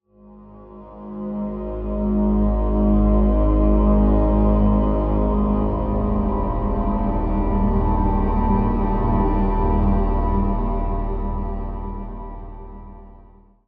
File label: Pad; Routed Sound; Spire Expansion - Doom Carpenter Vol.1